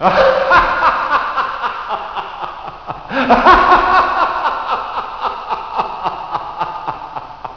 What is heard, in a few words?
scary laf; Halloween